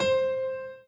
Piano Chord

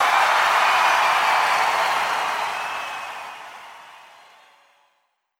drum hit clap

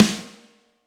Snare 14x6 Brass hard

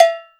Cowbell High

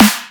snare

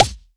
Poc; osu

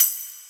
soft hit whistle

